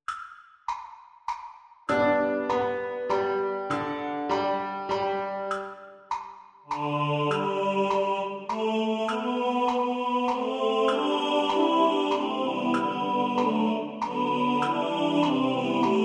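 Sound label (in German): Tenor2 im Vordergrund mit Metronom, Männerchor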